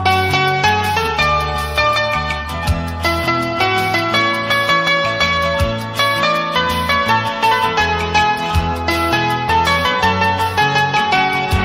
song Flute Tone

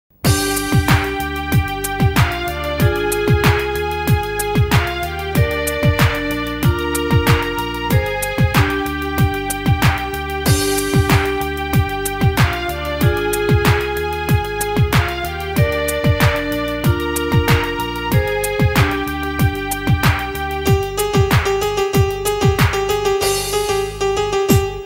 Song Instrumental Tone

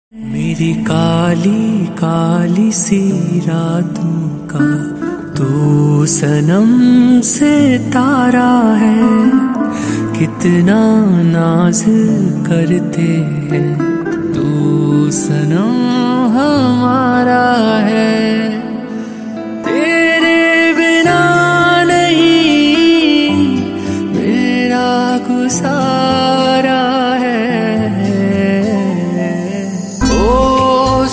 Male Version

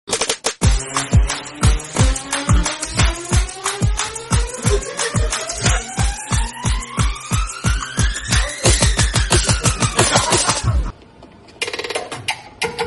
Telugu Movie BGM; Agnathavasi

